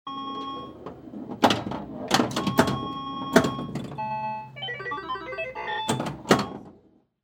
Technology Electronic Pinball Machine Buttons And Beeps